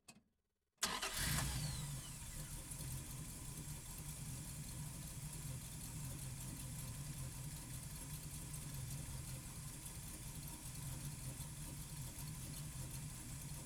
Plymouth Cuda Hemi -71 onboard startup idle blips steady rpms no gear driving constant; 1971